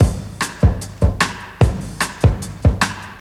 150 bpm modern pop drum loop sample g key

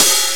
tamed open hat c key

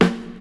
fresh clear snare a sharp key

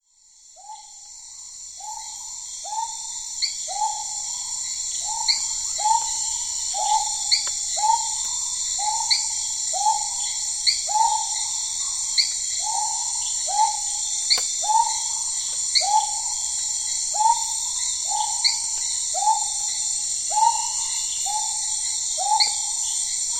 amazonian frogs and crickets

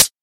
clean pedal hi hat one shot d key; hat samples

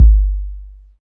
smooth bottom end steel kick drum sound G key; Duration 0:01; Samplerate 44100 Hz; Bitdepth 24

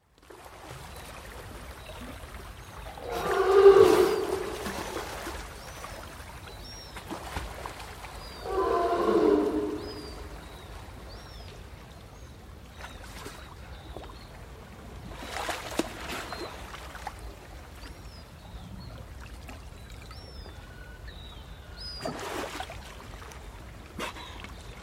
sea lion and sub-antartic gulls

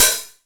open hat one shot g key

